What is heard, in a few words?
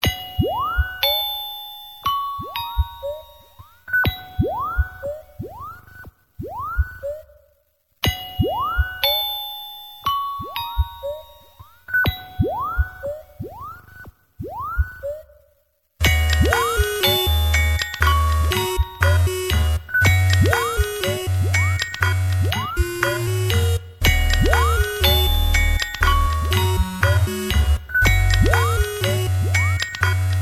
trombone live